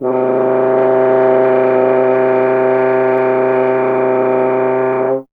F. Horn; Miroslav Vitous; Solo Instruments VOL-2

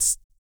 TONGUE HH, Vocal Planet Blues & Soul; ILIO

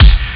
super bass drum; Amiga Soundtracker Sample Packs

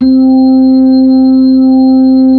B-3, Brite

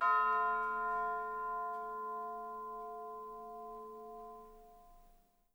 F4, Percussion & Harp; Miroslav Vitous

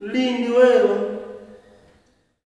"LINDE-WAOH", MALE; Spectrasonics; Heart of Africa 2; S.MALEAMB

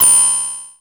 PRO1 REZ, TEC INDU PER; Crypton - DrumBase5000

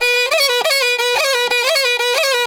riti grooves; Best Service; World Colours

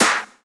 CLAP, Dance Drums

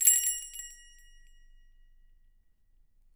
Percussion & Harp; Miroslav Vitous

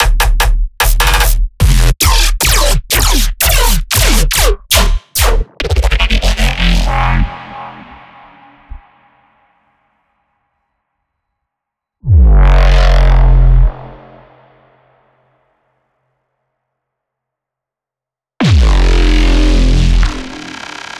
Bass Stabs

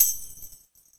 BASIC ENSMBL; OMI - Universe of Sounds

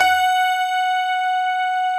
GRAND PF F#4, AKAI S-Series

